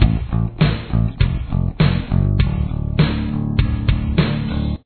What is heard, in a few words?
verse bass